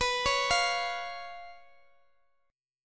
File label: B Suspended 2nd Flat 5th B Guitar Half Step Down, chord